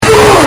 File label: vocal version